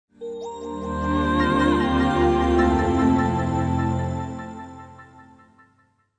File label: windows vista startup